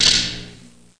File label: Arc